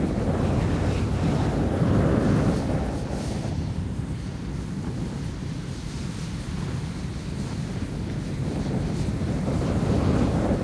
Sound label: plane; World of Tanks